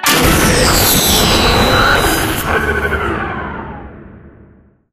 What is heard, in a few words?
dinosaur fizzle, ambient; video games; source engine; portal2